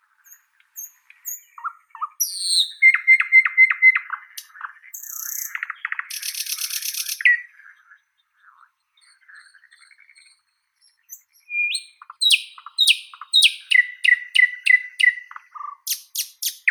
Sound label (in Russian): solovei obiknovennii luscinia luscinia, zvuki prirodi